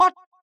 jump; ebusimaru

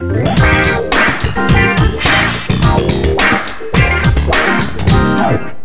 organ; TouchMaster DS

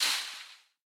Disc Rico Tree; boomerang fu

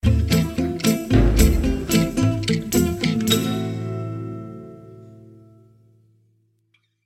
QUEST FAILED long; Roller Drama; FA grottesca 2

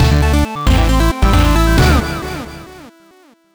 Goal; super cat tales

